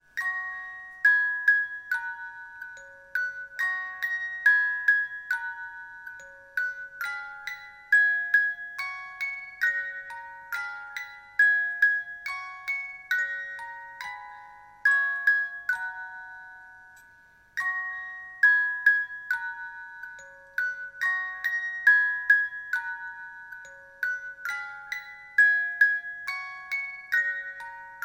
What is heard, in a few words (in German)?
Spieluhr; Schlaf Kindlein Schlaf